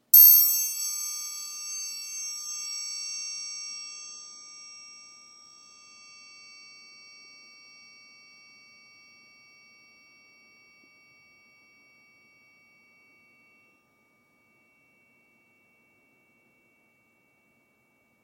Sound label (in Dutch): Triangel Brons Klein Gehamerd